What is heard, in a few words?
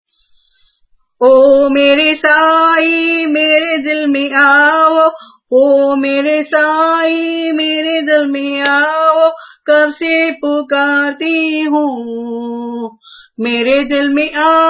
Bhajan; Oh Mere Sai